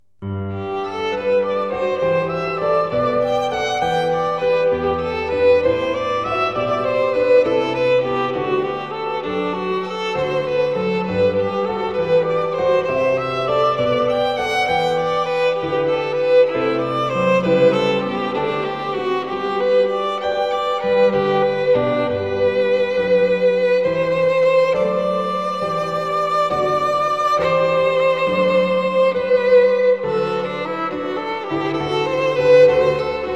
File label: Pop violin